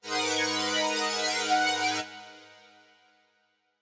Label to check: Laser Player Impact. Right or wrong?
Wrong